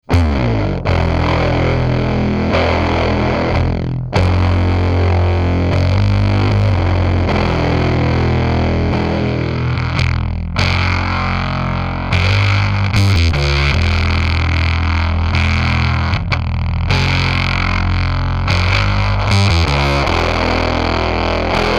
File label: Bass War Bass Fuzz 3 o'clock 50 50 Tone Sweeping; Death By Audio; pedales efectos fuzz